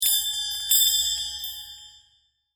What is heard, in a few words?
call mail; HTC; Infobar-A02